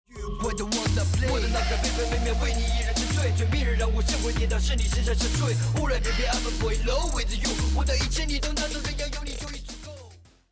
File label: bomb ten sec count; music kits custom